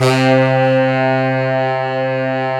SAX APPLE C3, SAXAPPEAL; Synth

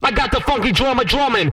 DRUMMER, R&B Dance, HIP-HOP, Vocal; TB HIP-HOP

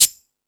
Rock N Roll, Drum Machines

